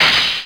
door gate close; left4dead

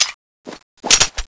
gunkata reload, weapons

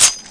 garand clip eject; weapons; dod; ambience

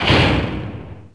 door metal close; left4dead

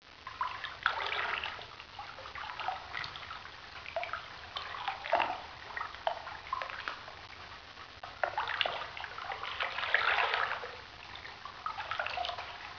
water lap; cstrike

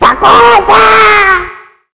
knife; QuakeSounds

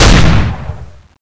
rail canon, weapons

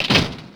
door shop close; left4dead

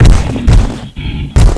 walk; Frozen Terror

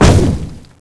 jump start; Frozen Terror

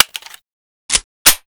reload, weapons; balrog1; cstrike

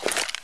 he draw, weapons

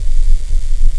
idle, weapons; gungnir

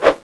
slash; balrog9; cstrike; weapons

